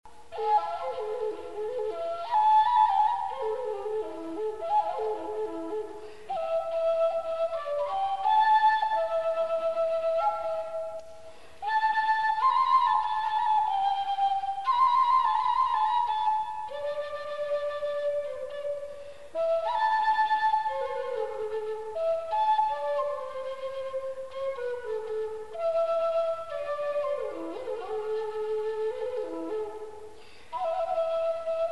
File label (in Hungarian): rubato furulya